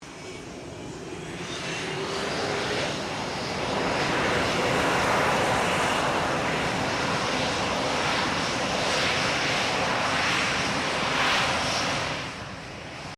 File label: FA-18 Hornet taxi, stereo; RAAF; Airplanes; Sound Effects:Vehicles